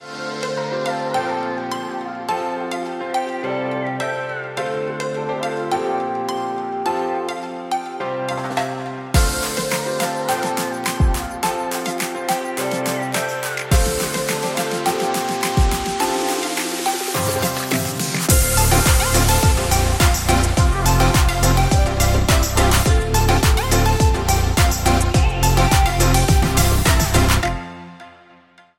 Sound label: Tropical, BPM105